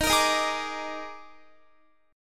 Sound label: Eb Major 7th Suspended 4th, Guitar; EbM7sus4 strummed